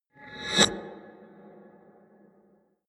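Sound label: musical swell short reverse, drones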